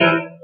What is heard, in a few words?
E4 Piano